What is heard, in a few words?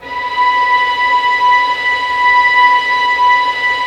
STR VLS, STRINGS; Roland - LA Composer vol. 1